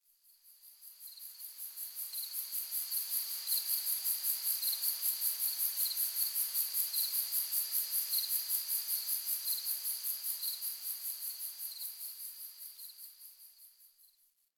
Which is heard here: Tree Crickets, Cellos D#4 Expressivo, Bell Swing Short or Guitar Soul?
Tree Crickets